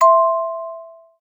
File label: Latch, notifications